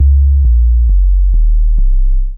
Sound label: groove 101 bpm; MIDI Clips; Boom Nation; Ableton; Factory Packs; Beat Tools